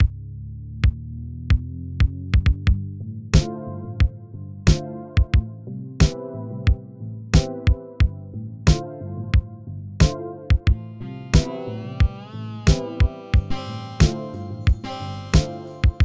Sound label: Dance; MiSide